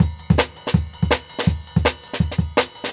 Jungle Breaks

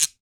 guiro

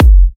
F, kicks; markus hakala kicks

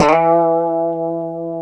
E 3, Guitars; Spectrasonics; Hans Zimmer Guitars VOL-1; 90's Sample CDs; COMP POP+VIB

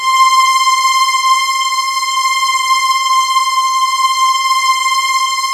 SAD CELLO, JV-1080 ORCHESTR; Syntec; Wall of Sounds VOL-2; ORCHESTR-EXP

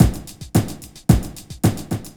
Basic, beats; Sonic Foundry (Sony Creative Software); Ugly Remnants VOL-2

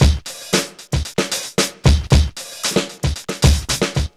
MONSTER 114, Funky Elements loops; Zero-G

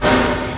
XENON FX; USB Soundscan vol.24; AKAI; Industrial Loops

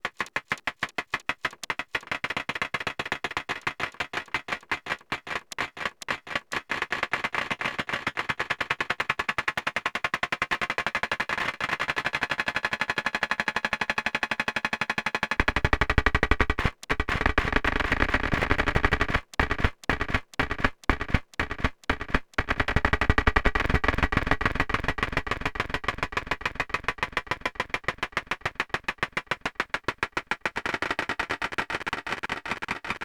tapping the glass; Graz

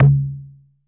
LATIN TOM LO, DRUMS; Music Store Sound Service; Einstein

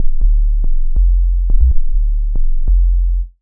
Bass Line; Eclectic Electronica

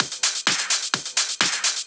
Clubhouse Loop 128 BPM

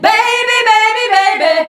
VOX, Singin

